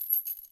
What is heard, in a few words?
chain, step; blocks